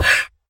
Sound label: donkey hit; horse; mob; Project164